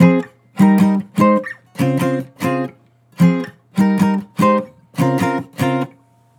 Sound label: Acoustic Chord Loop 150 BPM F Min; Cymatics